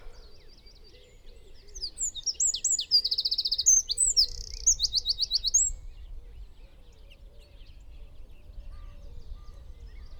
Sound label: Eurasian Wren Troglodytes troglodytes troglodytes